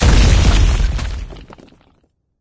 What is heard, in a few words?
rock crash; video games; ambient; source engine; portal2; outland